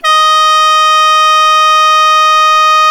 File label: THAI PIPES; Exotic Winds